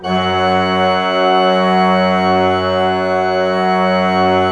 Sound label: F2, Cathedral Organ, PLENO; Propeller Island; PED.PLENO